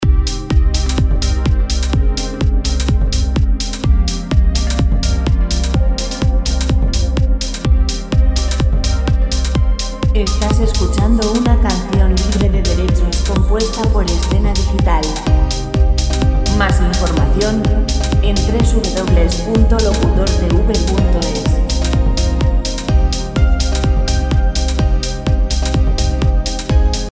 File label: 126 dance; background royalty free music